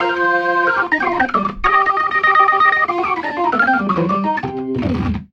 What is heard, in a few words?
RIFF, ORGAN RIFFS; InVision Interactive; Keith Emerson